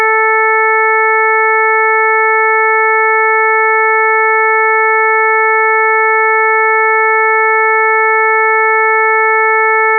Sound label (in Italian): armoniche, sawtooth; pedersini